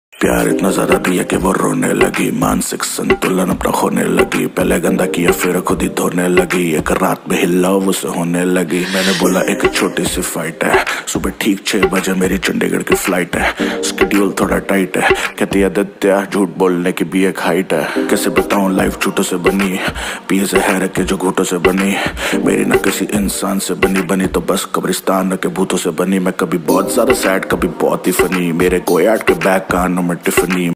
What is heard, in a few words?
hindi rap ringtone